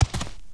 Giant Red Condor Run

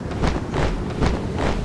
run; Seer; GamigoNA

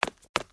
Run

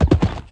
foot; Pergy; Gamigo

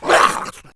Fire Atk

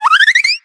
King Crab Action; Seer; GamigoNA

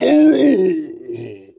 Torturer die

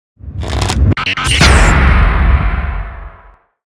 Bomb Shot; Seer; GamigoNA

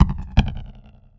statue run

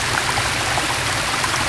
waterfall

